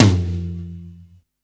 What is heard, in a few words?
Tom, Drum Hits